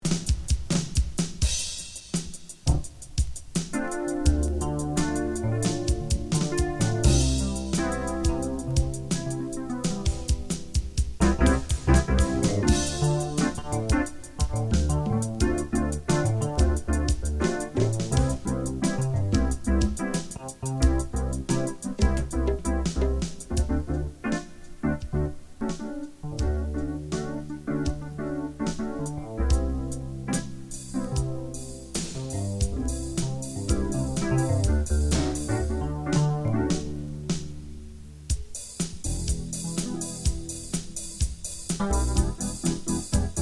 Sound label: E Piano Disco